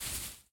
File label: Footstep Foliage Bush-Big